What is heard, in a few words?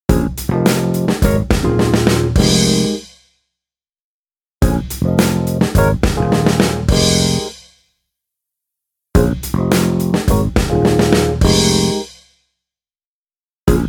Rhodes Compare